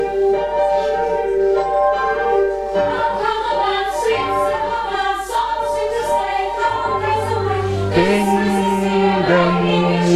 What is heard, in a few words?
Alt, gospelchor; Now let us sing